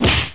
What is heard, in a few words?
hands